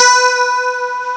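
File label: guitar high; CMI Sound Library Rev 1.3; GUITARS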